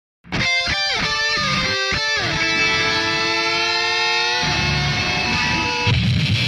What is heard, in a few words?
Guitar; One Punch Man